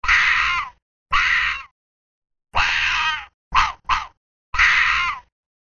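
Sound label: arctic fox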